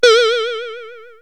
Space Boing (Medium); Beep Sounds; Bings & Boings

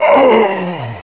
death; QUAKE2; male

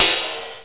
(B2) Side Cym; SoundMusicSys; Simple MIDI Player

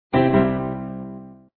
undo

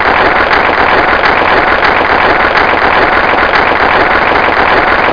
clap

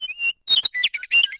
bird; DAoC

